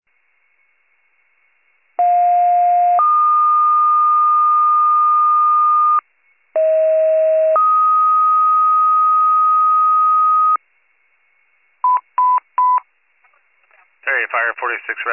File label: fire, pager alert